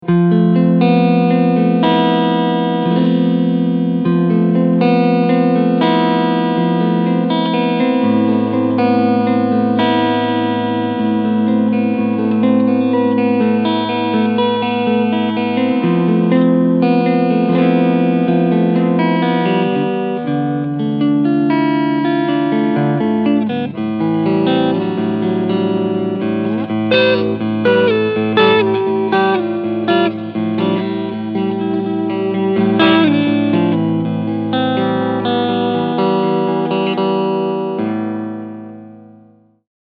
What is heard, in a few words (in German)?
beide Humbucker Clean Singlecoil; ESP E-II Eclipse BM